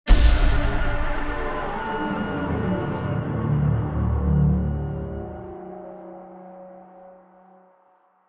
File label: String; Middle-earth Shadow of Mordor